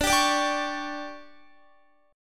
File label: D Major 7th Suspended 4th, Guitar